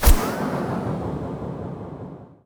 stifling dagger target; weapons; dota; phantom assassin

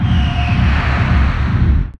celestial hammer projectile; weapons; dota; dawnbreaker

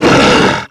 death worg; dota; creep deaths

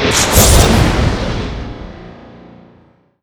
pick, projection; ui; dota

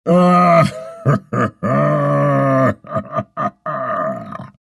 laugh; sven

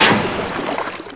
poison attack; viper

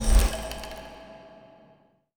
logo rolloff, ui; dota

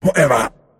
move pain; pudge

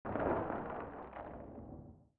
ice, ambient soundscapes; dota

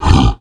grunt, roshan; weapons; dota; creep

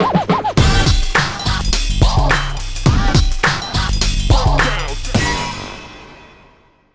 taunt rain; weapons; dota; furion

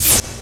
preattack; dota; dazzle

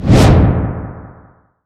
remnant create; weapons; dota; ember spirit

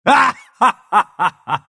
pangolin laugh; dota

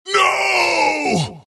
death long; dota; skeleton king

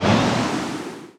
leech seed tick; treant

